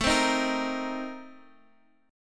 A sharp Major 7th Suspended 2nd Suspended 4th

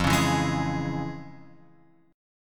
F Minor Major 7th Sharp 5th, F; FmM7#5; {1 4 2 1 X 4} strummed

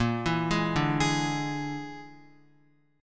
Bb 7th Flat 5th Bb, Guitar; Bb7b5; arpeggiated; JGuitar

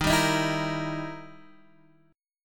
E Minor Major 7th Flat 5th, D sharp

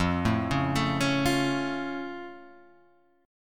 F Augmented

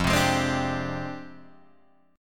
F Minor 6th Add 9th Guitar Drop D; 3,3,6,5,3,3; down; chord shape

